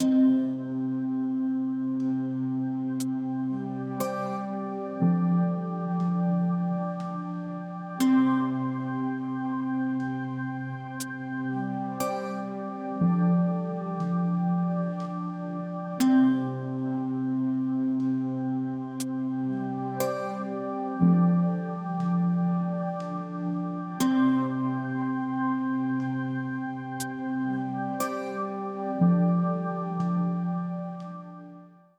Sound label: deepening mystery, ambient, BPM 60 / Key D; TinyBGM